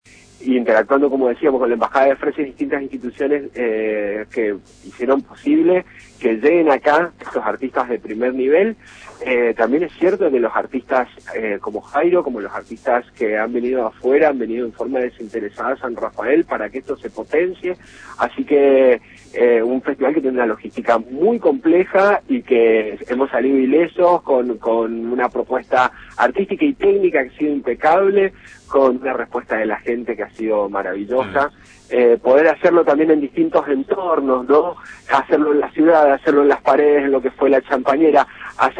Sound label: Jazz